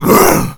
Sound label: pain, Zombies; zps; Z Punk